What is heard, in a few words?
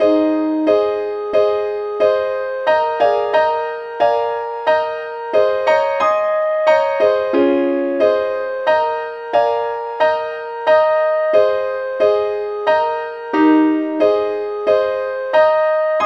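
lead sheet, key A flat; SAINTTHOMAS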